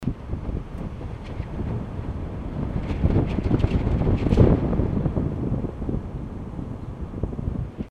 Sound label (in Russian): zvuk vetra